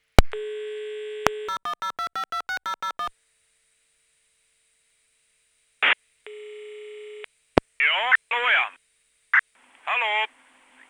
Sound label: pots to nmt